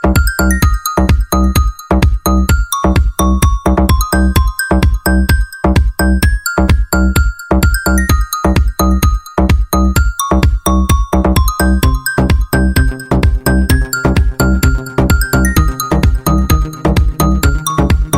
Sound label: crystal electro trap ringtone